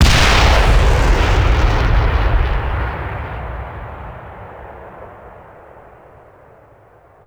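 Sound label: axis bazooka soldier; WAR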